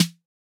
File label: Snare